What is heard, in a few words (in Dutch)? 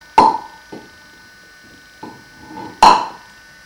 gele beker tegen muur